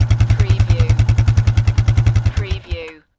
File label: Quad Bike Shut Off Exhaust Perspective